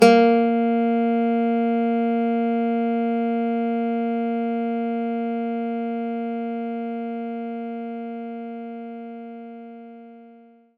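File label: Nylon Guitar A sharp 2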